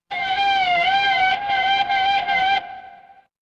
mechanical siren

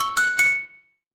feedback success

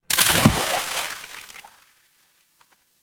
water splash

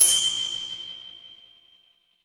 instrument bell

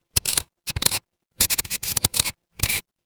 office pen

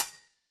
impact anvil

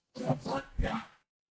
ui undo

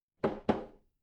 impact wood knock

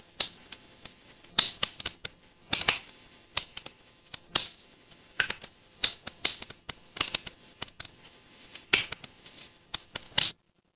ambience fireplace

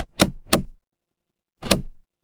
mechanical switch

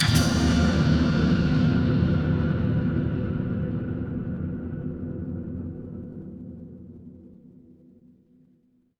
horror stinger

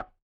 impact wood knock

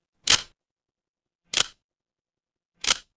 office camera

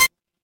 retro game combo

